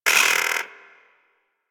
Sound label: Hyena Micro Vocal Pain; Warframe